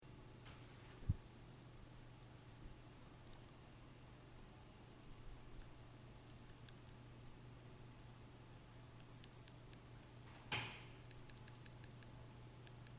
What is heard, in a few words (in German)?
klappern